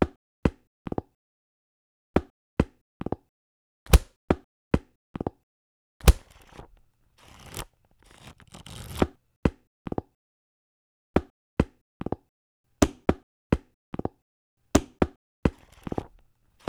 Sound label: musicbook